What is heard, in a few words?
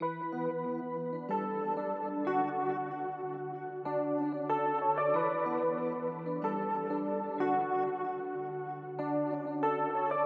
rhodes, Electric Piano; Electric Piano Rhoads; Various Keys